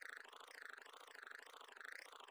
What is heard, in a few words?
Fly Flying; Hollow Knight